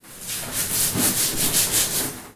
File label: Washing Body